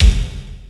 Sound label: RY-10, DRUMS + PERC, YAMAHA; Rubber Chicken Software Sample Bank